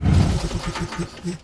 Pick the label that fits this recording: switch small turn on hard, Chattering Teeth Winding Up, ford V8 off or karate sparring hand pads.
ford V8 off